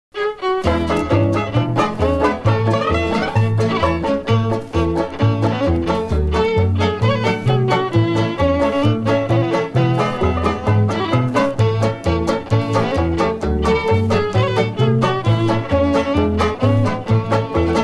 instrumental

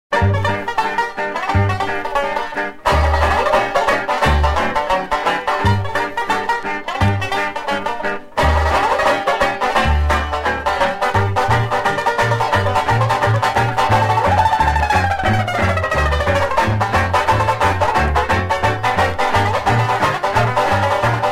blues instrumental

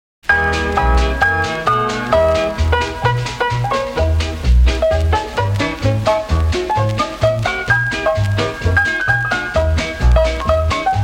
instrumental

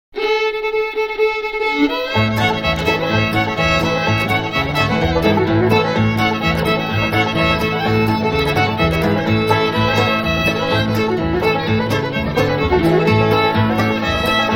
rag (instrumental)

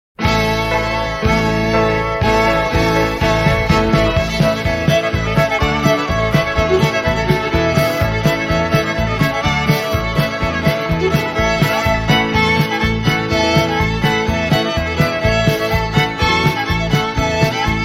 cotton eyed joe (instrumental)

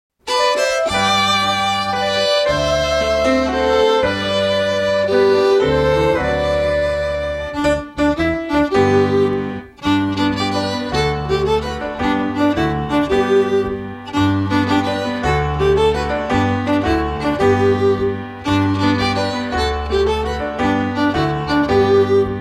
rye waltz (instrumental)

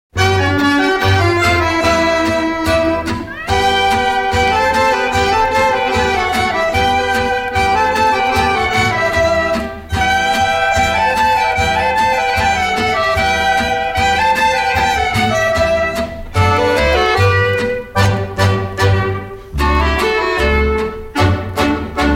tanz, instrumental

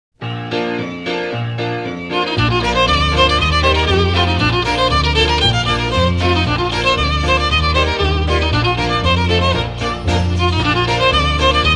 instrumental

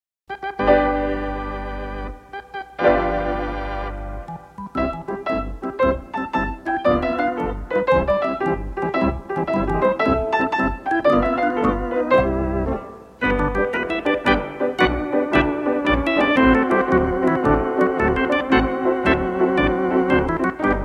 loomis lancers part 3 (instrumental)

